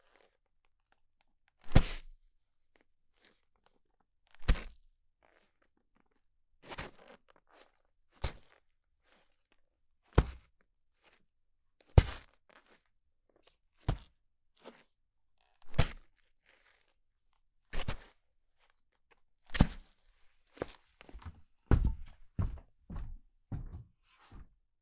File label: Hard Impact On Sand